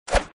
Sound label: Sfx Archer Attack